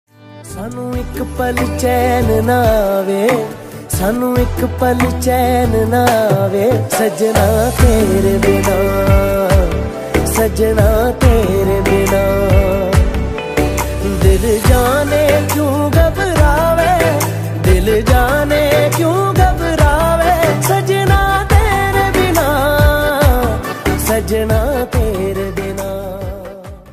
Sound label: New Punjabi Song Ringtone